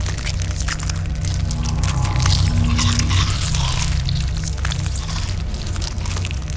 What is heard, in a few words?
kaiju egg ambience loop; biogenesis